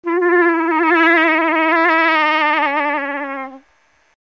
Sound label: Cow In Heat Long, Moose